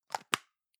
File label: plastic can lid screw close medium; objekte; dose